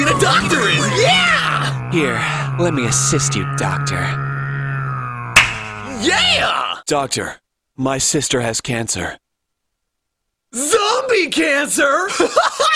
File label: Zombie